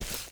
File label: grass, footsteps